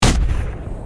WEAPONS chain Gun Shot; Barotrauma; EK modern

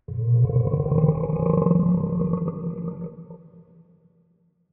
Mud Dragon idle; Barotraumatic

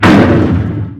Ice Crash; Barotraumatic

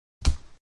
angel step; Footsteps; Barotraumatic

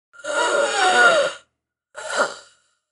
female wheeze; Barotrauma; Real Sonar

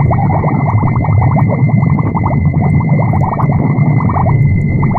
Drown Loop, Water; Barotrauma; Subsurface

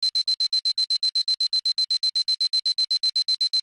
shield low; Barotraumatic

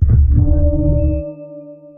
sonar Ping Air Close Directional; Barotrauma; Real Sonar

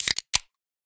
Open Can; Drinks; DynamicEuropa; Hungry Europans

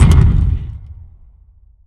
alien Ruins Wall, Damage; Room FX; Barotrauma

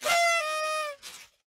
confetti; More Level Content; Barotrauma

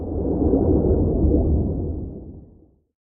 Blubb; Barotraumatic; Razzershrimp

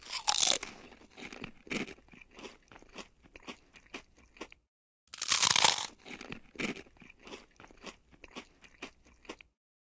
Eating Crunchy; Food; DynamicEuropa; Hungry Europans

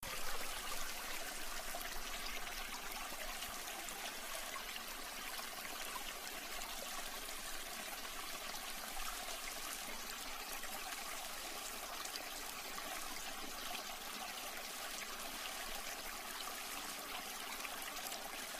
flow small, water; The Avali's Audio Overhaul

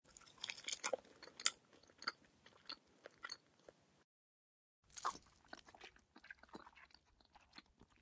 Eating Soft; Food; DynamicEuropa; Hungry Europans

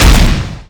autocannon fire; Weapons; EK modern; Barotrauma

